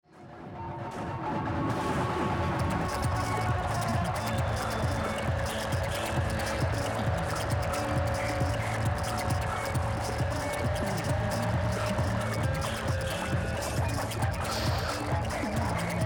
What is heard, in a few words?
fibonacci fm noise